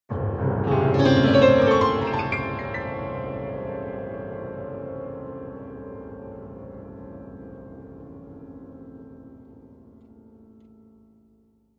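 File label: Grand Piano Dramatic; keyboards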